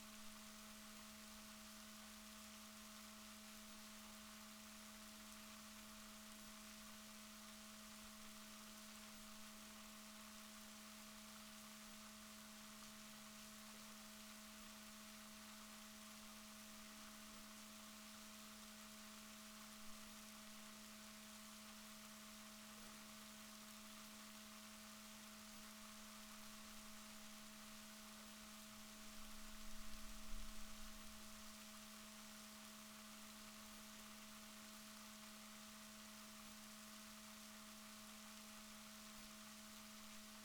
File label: rorquals negatif, Balaenoptera Physalus Chile